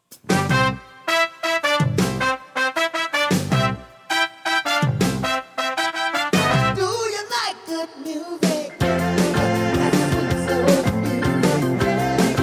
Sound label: Soul Music